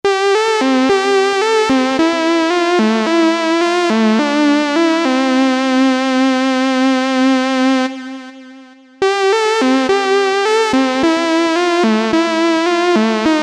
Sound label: Factory Patches, Novation UltraNova